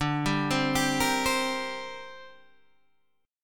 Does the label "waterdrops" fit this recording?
No